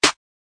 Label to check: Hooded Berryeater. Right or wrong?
Wrong